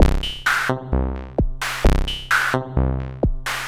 MODU C, Modular Synth Loops; Polyend; Simulacrum Sounds

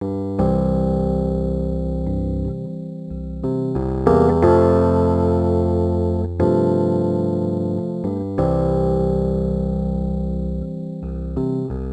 Rhodes Attacks; Ueberschall

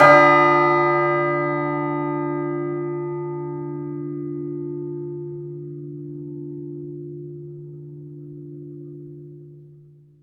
BEL CHRCH; Roland - LA Composer vol. 1

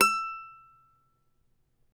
CAVAQUINHO E, Guitars; Spectrasonics; Hans Zimmer Guitars VOL-2

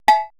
80S DRUM SET

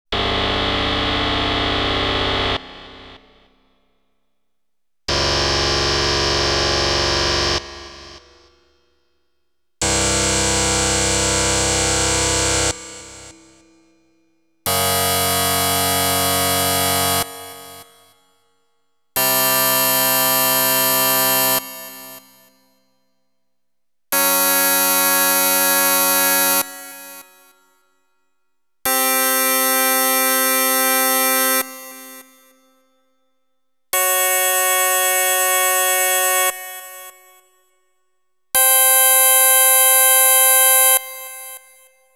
dance & techno, lead; Inspiration Zone

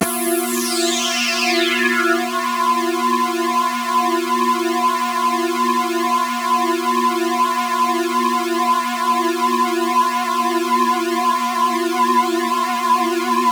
Melodics; neuro; Optiv & BTK

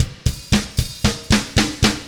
Beat Fill, Indie Rock; Sonic Foundry (Sony Creative Software); Indie Rock Anthems; Session 06 - Haze

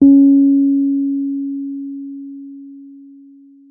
WURLITZER, C#4; Xsample VOL-1; 90 s Sample CDs; WURLITZER RS

